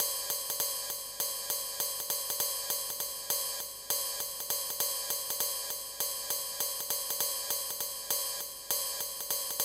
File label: JAZZ; INIS; Funky Xtreams